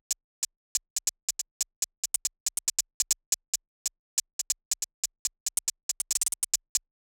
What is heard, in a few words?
hat